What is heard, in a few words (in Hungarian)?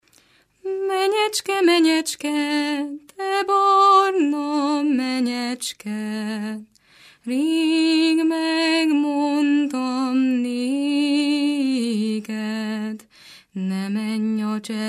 nepdal; menyecském menyecske